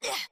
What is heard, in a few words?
land heavy; Ben 10 - Escape Route